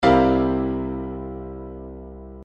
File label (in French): do 11 complet